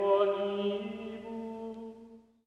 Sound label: Male Choir; Armello The Bandit Clan